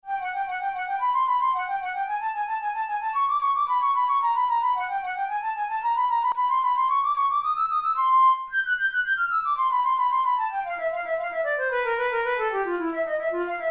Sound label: methode pour la flute study; drouet